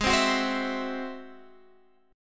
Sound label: G sharp Augmented 7th G sharp